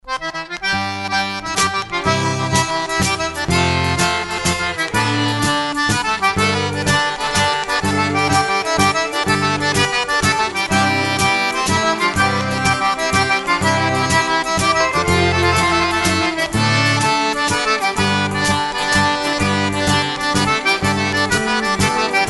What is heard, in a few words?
Waltz